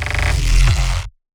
cool bass

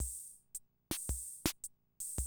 CR78, DrumLoops

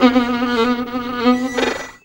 VIO MISC, MISC VIOLINS

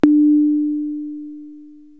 Conga, Korg TR-Rack

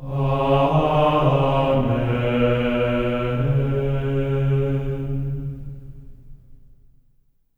AMEN C#4, Symphony of Voices; Spectrasonics; GR AMEN

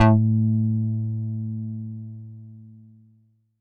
Pulseboy a3, Korg Poly-800

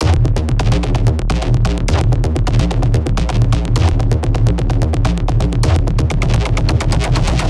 Doom Pluck, bass

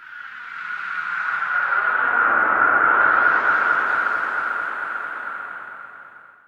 fx reverse; Ueberschall; Techno Trance Essentials

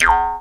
E JAW, Primitive Instruments

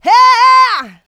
Vocals, Adlibs; Houseworx

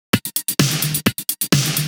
Clubhouse Loop 128 BPM

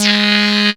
CREEPY G#2, sequencer; Best Service Alpha Dance vol.2

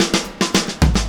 Drums Intro, Indie Rock; Sonic Foundry (Sony Creative Software); Indie Rock Anthems; Session 01 - Brighton

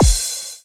BD; Best Service; Dance Mega Drums

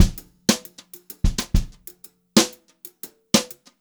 Brushed Drum Loops; USB Soundscan vol.39; AKAI

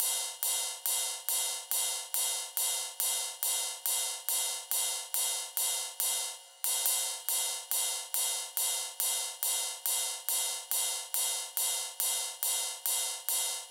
drum Loop 140BPM Ride; Multi Loops

